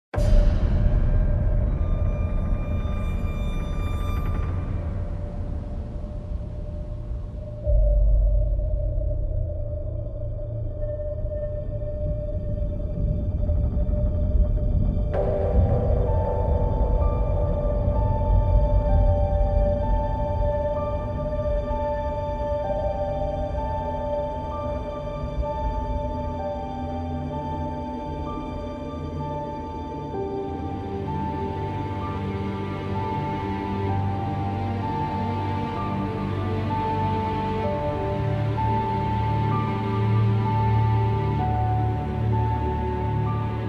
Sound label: Symphonic Orchestral